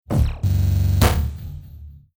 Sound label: Computer Interface Telemetry Bad Computer Processing Harsh Negative Scifi Digital; Tom Hutchings